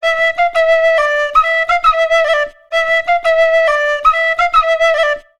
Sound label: Penny Whistle, Celtic; Sonic Foundry (Sony Creative Software); Continental Drift Aftershocks